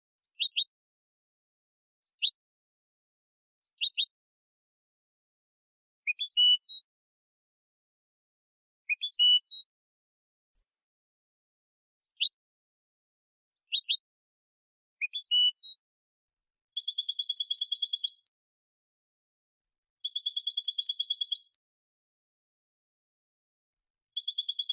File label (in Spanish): Canto del Jilguero Limpio; Chant chardonneret